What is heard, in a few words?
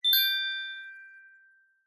multimedia alert bell chime notification metallic high pitched